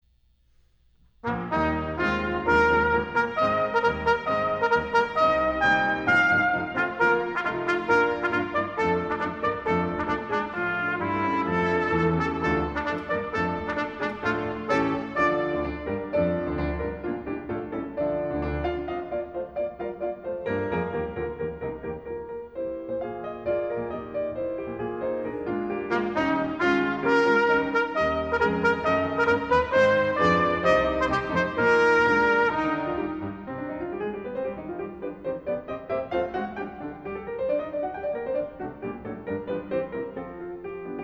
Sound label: Sonata for Cornet and Piano, Allegro con anima